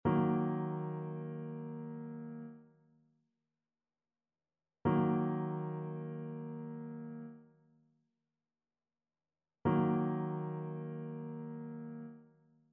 trichord; trichord identification

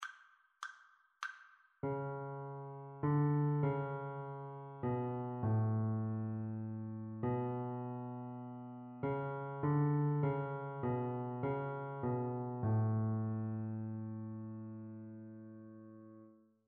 melodic dictation

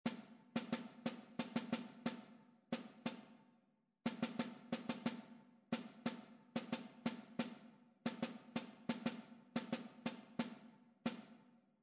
rhythmic error detection

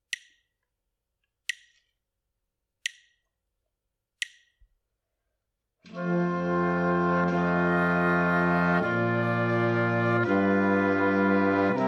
harmonic dictation; eartraining

